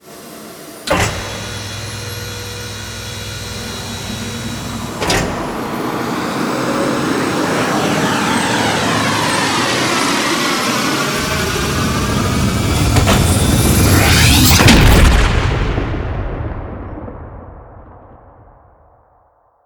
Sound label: Liset Take Off; Warframe; Tutorial; Cinematics